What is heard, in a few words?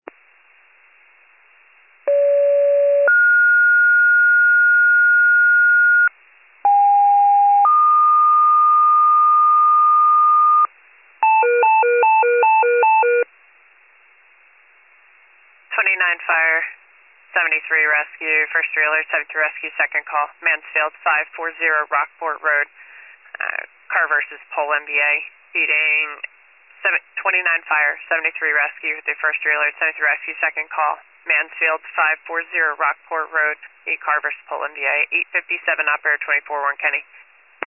fire, pager alert